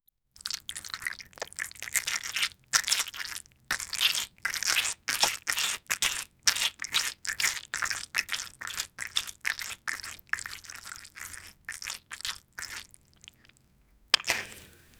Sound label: the bouncy feeling like